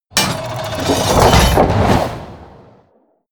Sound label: catapult siege trebuchet medium shot